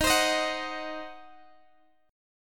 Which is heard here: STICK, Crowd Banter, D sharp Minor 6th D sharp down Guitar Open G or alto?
D sharp Minor 6th D sharp down Guitar Open G